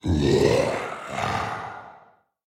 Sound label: Grunt; Grunt Enabled